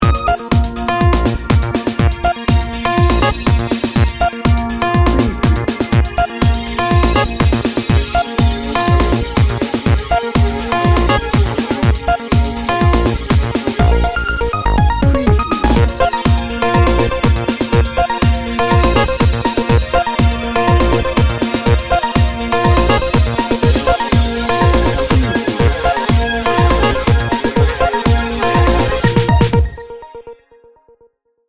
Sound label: dancing robots